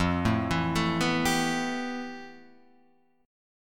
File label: F Major Sharp 11th, Guitar, Drop D